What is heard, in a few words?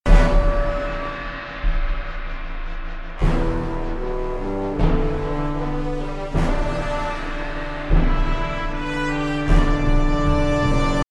synths subbass, a single subbass note on some of the hits; epic orchestral track